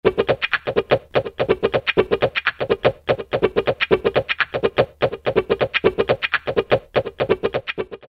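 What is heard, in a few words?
Trip Hop Wah Wah Guitar, Roland MC-303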